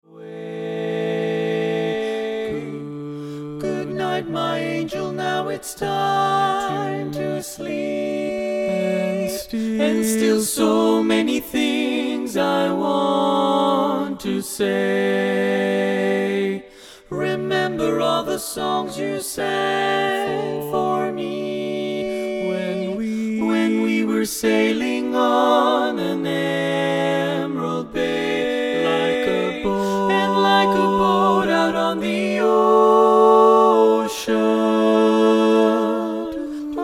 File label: Lullaby female